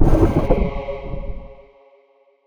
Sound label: Sound Powerup Holy Trigger